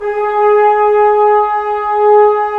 BRS BNS, Roland LA Composer; Roland - LA Composer vol. 4; Tbns

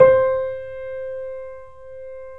C, 3-D Audio Collection, 3D Pianos, Yamaha C7; E-MU Producer Series Vol. 5

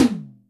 Hybrid H, Yamaha DTXpress Electric Tom; drum machine

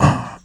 VOX4 R, Roots Disco; USB Soundscan vol.32; AKAI

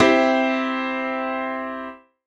Grand Piano Chord; Big Fish Audio; OSH Chords

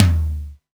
DDD-1 Tom Low, drum kit; Polyend; Stazma & Concrete Collage; Concrete-DDD1

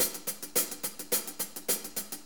4-4 HiHat Loop; Drum Tools; 4-4 Drum Loops; HiHat & Ride Loops

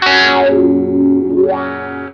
WAH DOWN, Funk Gtr & Wah; PlatinumPhattCD2; E-MU Producer Series Vol. 8 – Platinum Phatt

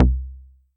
Tempest 808 Style Kick

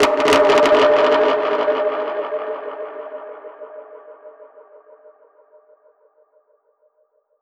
Perc Hit, dub percussion

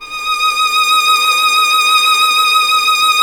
violin, Solo Instruments

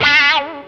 WAH CRY, Distortion Gtr; PlatinumPhattCD2; E-MU Producer Series Vol. 8 – Platinum Phatt

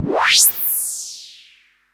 noise fx; Masterbits; Switch FX

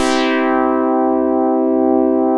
BOLERO DR, Synth and Organ

